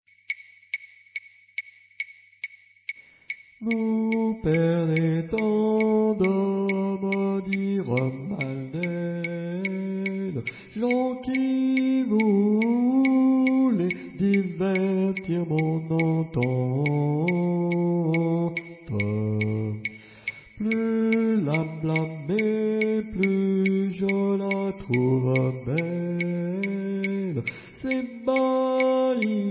perdez hom, chants

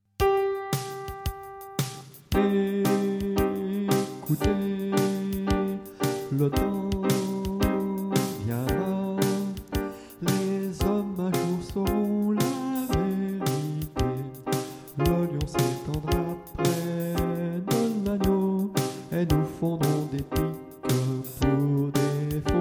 canon filles; chants

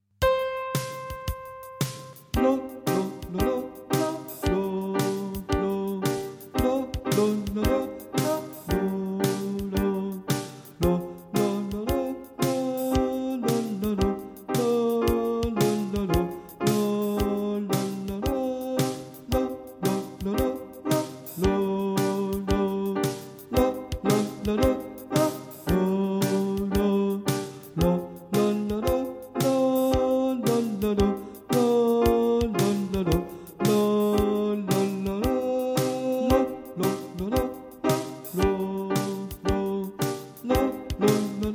canon tenor; chants

